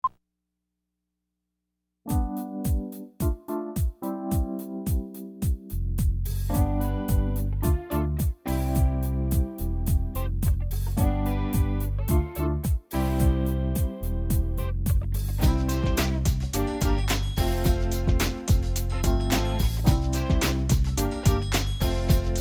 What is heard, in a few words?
Motown Fun